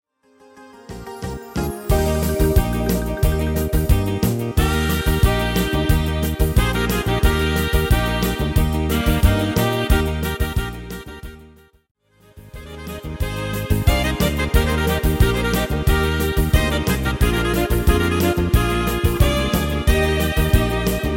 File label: Sax